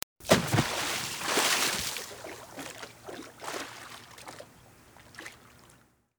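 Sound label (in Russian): всплеск и шум волн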